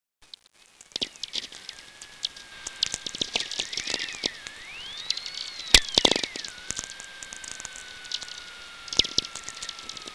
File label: vehicle